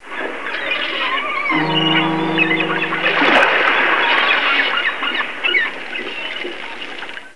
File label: gulls waves horn